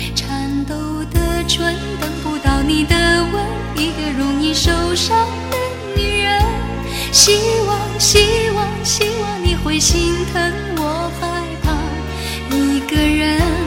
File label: chinese song